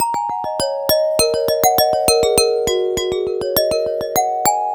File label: Fast Glass; Ambient; Melodic; Ambient-Melodics